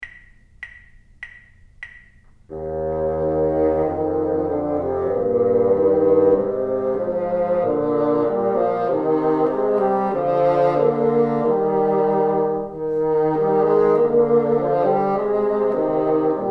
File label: together, bassoon